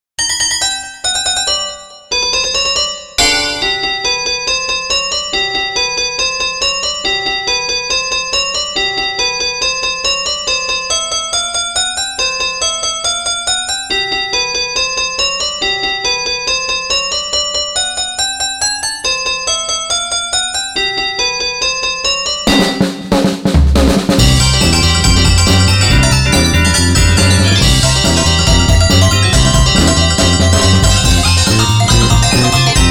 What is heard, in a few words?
Kids Blues; gekiban